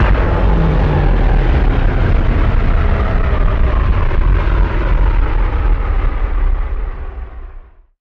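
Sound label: Power Down Beveled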